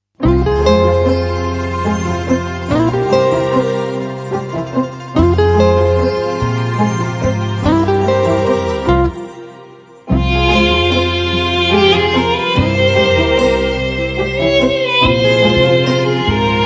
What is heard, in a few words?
Instrumental Ringtone